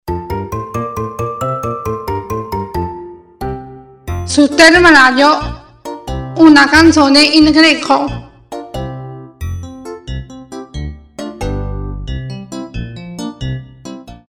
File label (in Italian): Canzone in greco